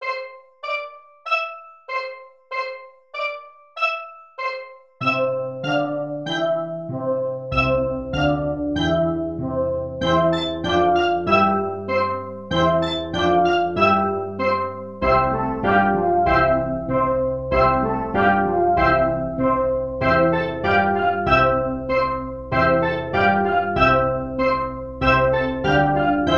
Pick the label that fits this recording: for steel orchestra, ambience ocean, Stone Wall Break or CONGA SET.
for steel orchestra